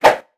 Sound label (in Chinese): 重攻击, 挥刀; 音效; 战斗